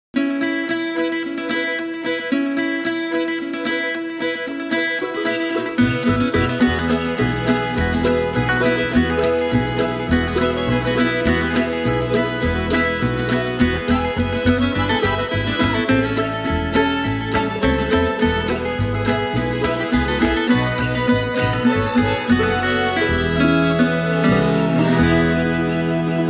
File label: Jingle Bells